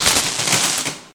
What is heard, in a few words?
Electricity Spark Connect